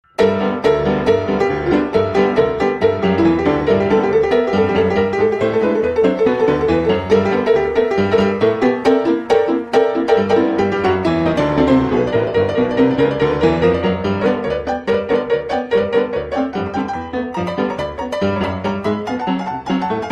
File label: Ragtime Saloon